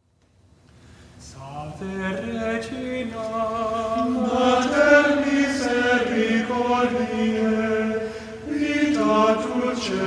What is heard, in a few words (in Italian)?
Salve Regina Canto Gregoriano